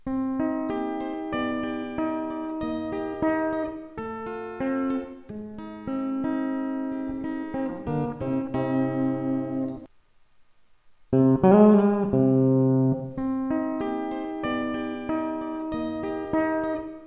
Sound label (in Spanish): velocidad, guitarra